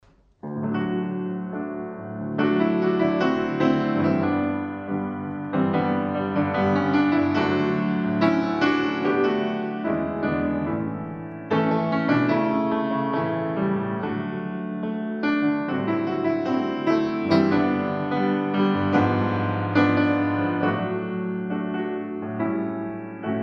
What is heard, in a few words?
Piano